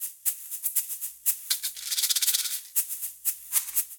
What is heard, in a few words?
Shaker & Maracas; Latin Percussion; Instrument Kits; Ableton; Factory Packs